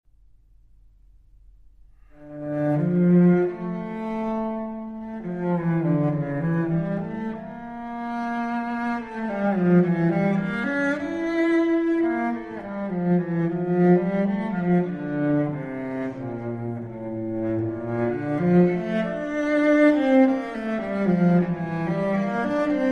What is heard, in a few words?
Cello Prelude D Minor; Cello Suite No2; BWV1008